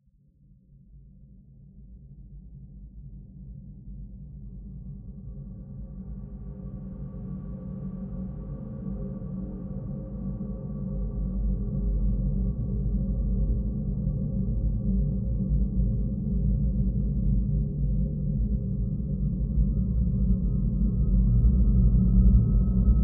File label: Ambiance Dark Pad